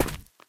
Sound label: concrete, footsteps run; player; source engine; left 4 dead 2; survivor